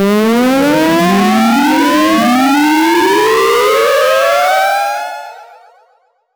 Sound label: taking damage sound